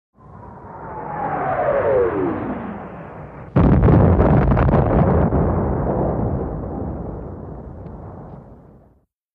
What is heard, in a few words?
Incom Shell Whir, modern artillery exterior